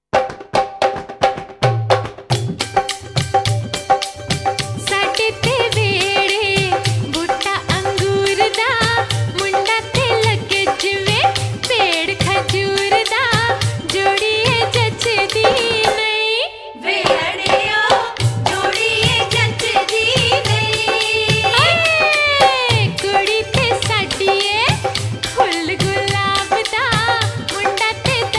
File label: Traditional Shaadi Geet